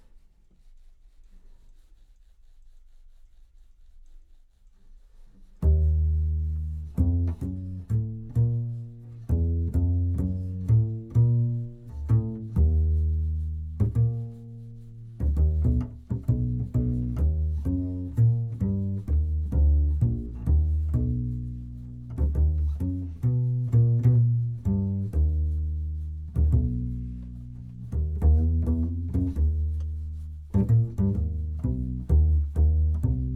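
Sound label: Contrabass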